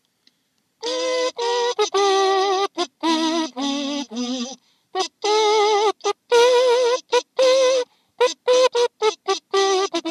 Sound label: trumpet kazoo